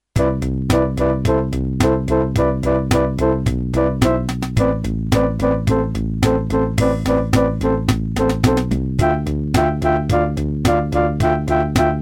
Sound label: casio funk; altparty05; telephone queue music